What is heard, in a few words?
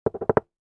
Door knock sound effect